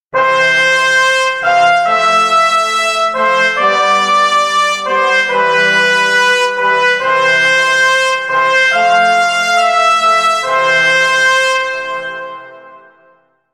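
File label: sounds of fanfares and solemn award ceremony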